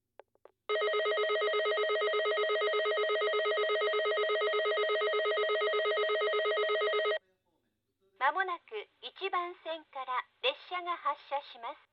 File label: matsumoto local tatsuno, station; jr-east